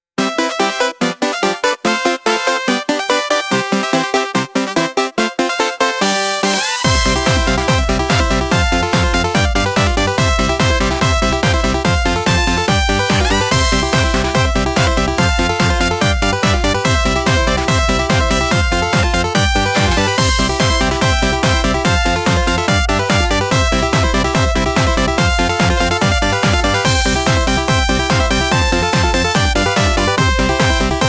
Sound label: heroic triumph; freepixel